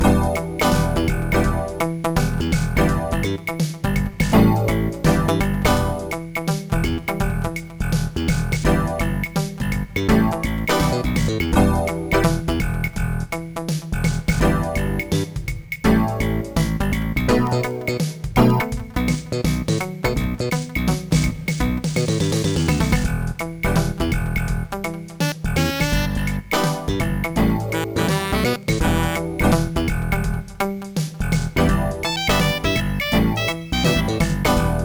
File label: 16 beat bounce